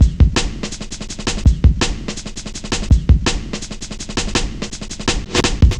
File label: Cut Thru B, Drum Loops, Jungle, 165.5